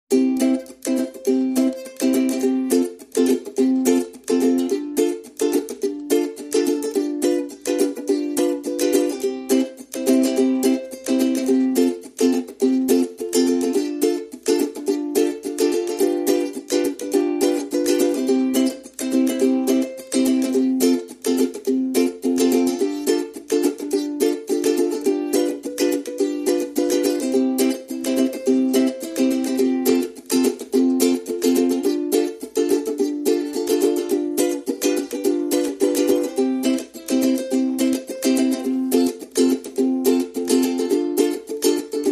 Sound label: Ukulele Only